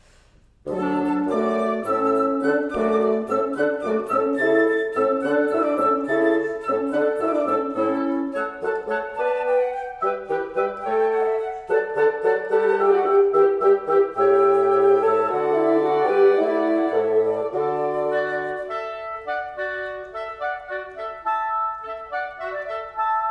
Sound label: Allegro Maestoso